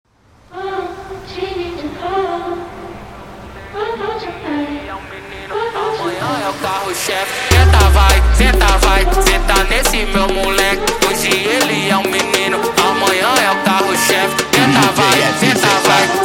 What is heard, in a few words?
sped Up, funk